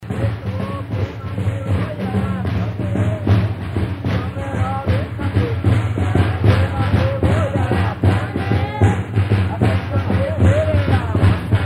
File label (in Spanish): caja